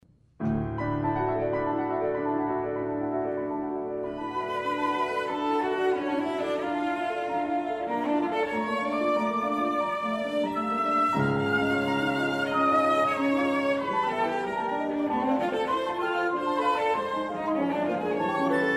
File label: flute; gaubert